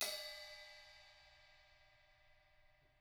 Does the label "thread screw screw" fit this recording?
No